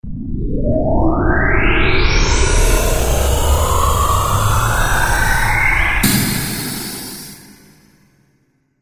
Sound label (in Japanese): ワープ音 モノラル